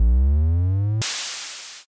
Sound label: compressor